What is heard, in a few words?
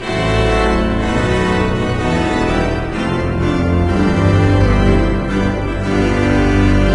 Wedding March Sting Music